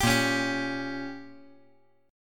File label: A sharp Minor 6th A sharp down, chord; strummed; JGuitar